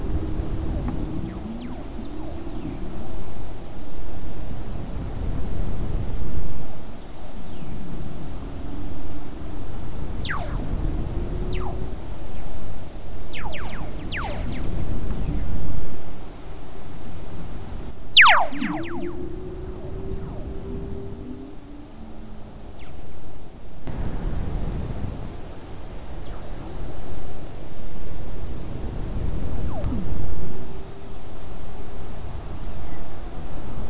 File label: WFR audio emfisis